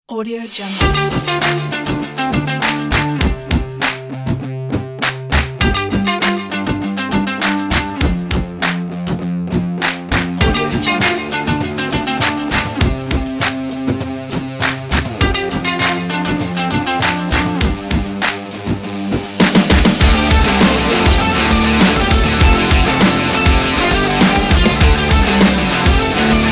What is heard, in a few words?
indie rock sports powerful energy